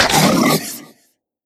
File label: draugr injured